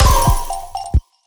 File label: PLAYER DAMAGED; quantum recharged gamerip 2023